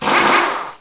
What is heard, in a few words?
AIRSP